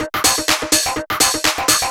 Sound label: 125 BPM, Drum Loops; Zero-G; Club Techno; Drum Set